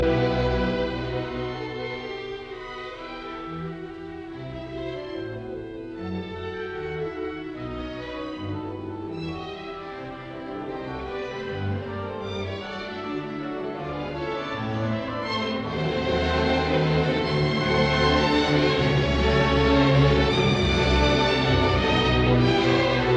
Symphony in E minor Allegro non troppo; Brahms; Op 98

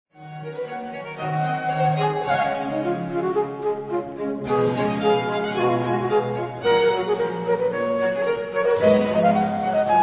for 2 violins & continuo Sonade; Les Nations

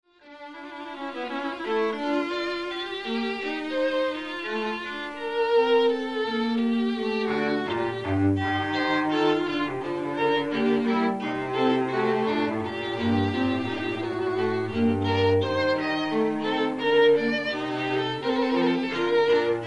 Fugue in G minor

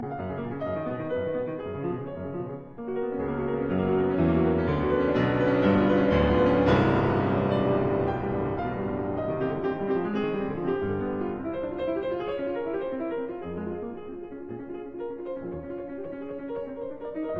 Piano Sonata in F minor Prestissimo possibile; Piano Sonata No 3; Op 14

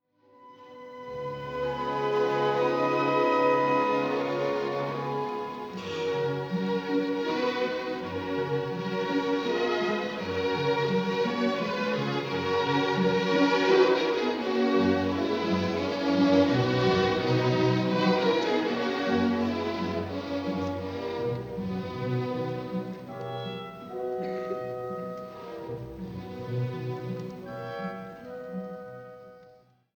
Symphony No. 2 III. Allegretto grazioso; Brahms

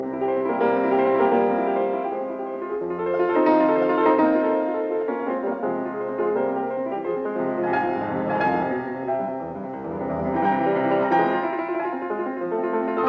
Piano Sonata in F minor Allegro ma non troppo; Beethoven; No 23; Op 57; Appassionata

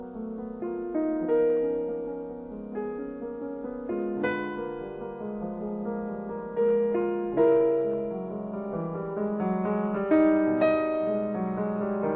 Etude in E flat minor; 12 Etudes Op 10; Etude No 6; Paul Badura Skoda

